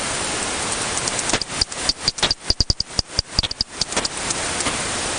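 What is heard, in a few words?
front window Batbox Duet 20kHz heterodyne, bat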